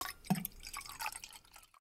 Pour; Treasure of Nadia

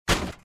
shield; The Witcher Hunt

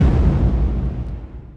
boom; Sex Traveler Comic Convention

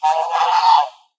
Goose; Treasure of Nadia

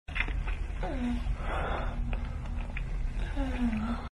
suck, moans; The Witcher Hunt

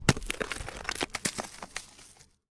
Rocks; Treasure of Nadia

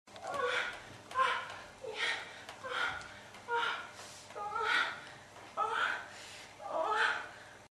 sex, moans; The Witcher Hunt

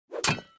sword stone; The Witcher Hunt

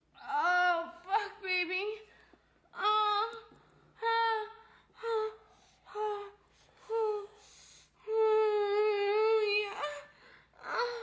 sex, moans; The Witcher Hunt

